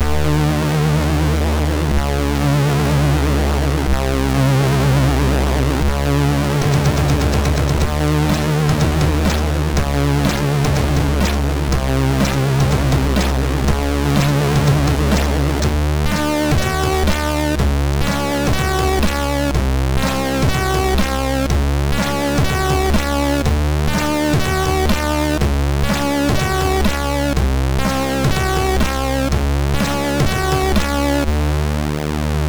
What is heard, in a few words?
sid MOS6581R2